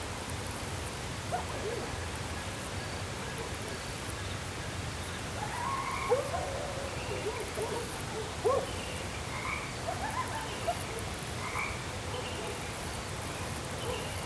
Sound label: forest, ambience